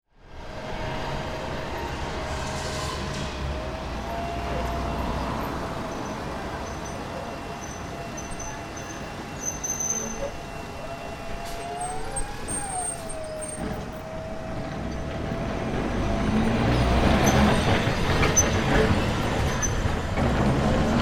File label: Tram Is Starting; Piolho